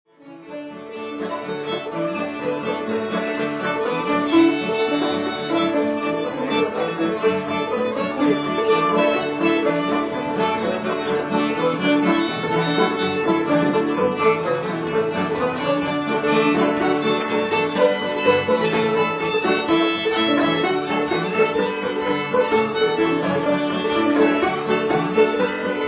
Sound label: Fiddle, Banjo, Guitar; Blue Eyed Girl; Old-Time JAM